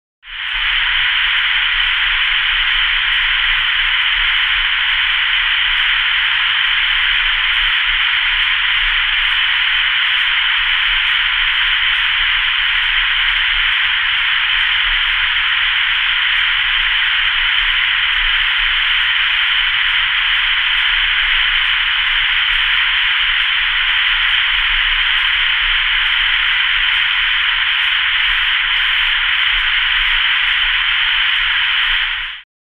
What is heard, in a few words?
Radio Static Constan, static